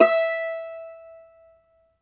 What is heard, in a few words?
Cumbus Saz; Plucked Strings; E-MU Producer Series Vol. 7 – Old World Instruments